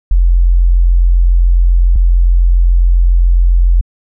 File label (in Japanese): サイン波サブベース